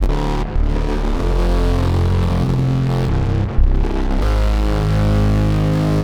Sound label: Bass, Futurist Drum & Bass